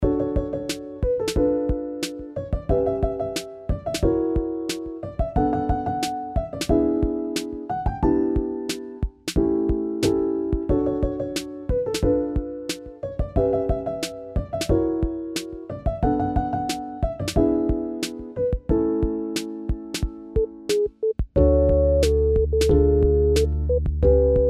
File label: chill